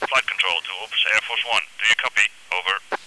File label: 747 radio, ambience